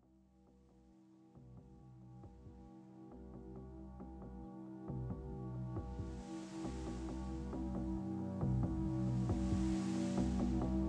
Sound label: sea